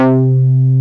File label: OB-8 bass; Greytsounds VOL-1; OBXA